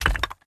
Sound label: Figure Take; Game rip; MiSide